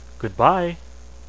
stop

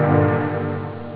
techno; OctaMED SoundStudio V1; stabs

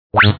level Go; athlete

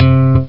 steel guitar; OctaMED SoundStudio V1; jazzies samples

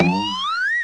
bounce

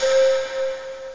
recorder; OctaMED SoundStudio V1; mirage

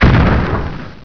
Hellhit, Weapons; Heretic II Demo

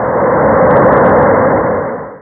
GOAL

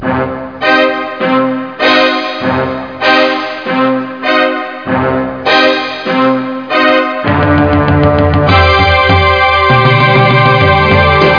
Orch; WARLOCK; KT.Orch2

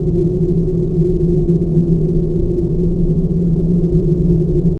WIND LP; Dark; thiefdg

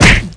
punch; Messiah

